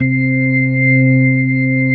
C3S, B3

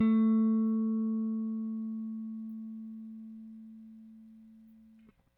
RickThumb-A, RickenbackerBass; Airwindows

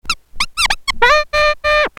TURNTABLES, Hip Hop

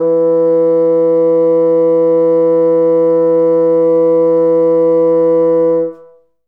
Bassoon E2; Best Service ProSamples vol.51; Classic Orchestra 2

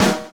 Snare, drums; Sonic Foundry (Sony Creative Software); Drums & Drones; Construction Kits; Skip Kit

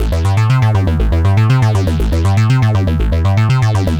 Arpeggio Bass, Prototechno; Sonic Foundry (Sony Creative Software); Basses

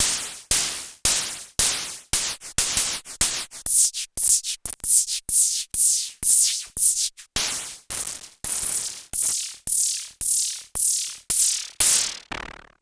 Klone Dual Percussion Synthesiser KLONE NOIZ; Sliced

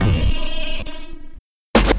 Scatch Hit Loop; SFX; Patterns; Electronic Point Blank

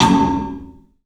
CLUB SN VRB, 3D Drums; 3D Sound

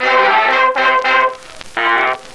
Old Scratchy Sect, Effected Horn Section; Sonic Foundry (Sony Creative Software); Jazz Solos and Sections

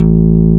NYLON A1, BASSES

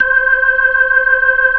C5F, B3

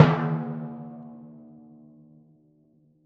D#2 f, Timpani, forte; PowerFX; Downtown Orchestra; scales

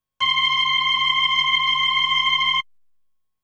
B3 Organ; Masterbits CLIMAX 8

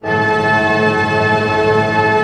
TREM D# PP, ORCH TREM; Sound On Sound; Peter Siedleczek's Orchestra

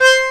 ACCORD C4, Accordions; USB Soundscan vol.40 - Complete Accordions; AKAI

